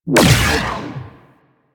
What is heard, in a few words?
Mega Beam Fire; Warframe; Venus; Venus Flying Spaceman